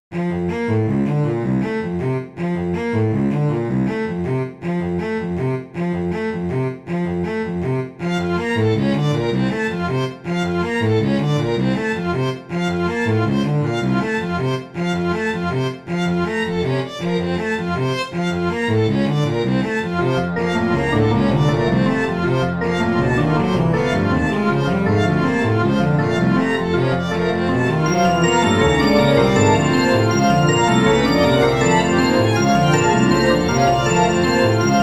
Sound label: Cello vs Violine